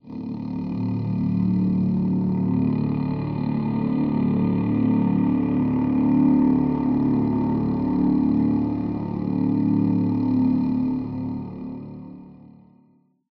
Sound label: Combat Pad